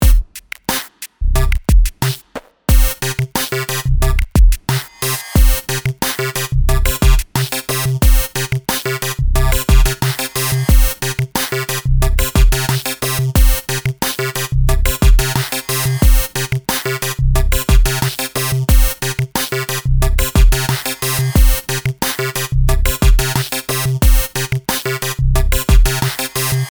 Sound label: plus gate drums, sequencer; Numerology; Five12